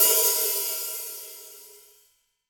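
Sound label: open hi hat, Real Drum Kit, FUNK KIT; Best Service ProSamples vol.43 - Real Drum Kits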